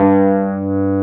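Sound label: Wurlitzer G2 - R; USB Soundscan vol.09; AKAI; Keyboards Old School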